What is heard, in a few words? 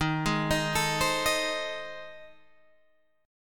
A Minor Major 7th Flat 5th